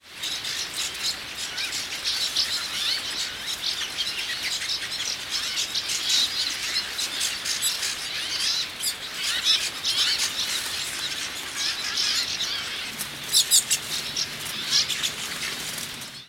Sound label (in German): bergfinken